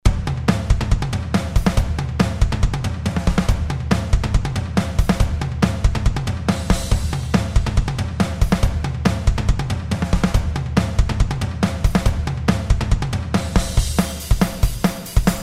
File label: drums